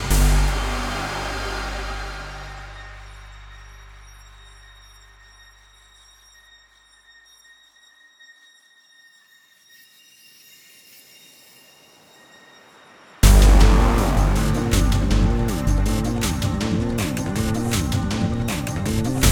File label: Sonar Fight 19 Bars 160 Bpm